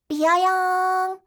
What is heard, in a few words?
biyoyoonn; oto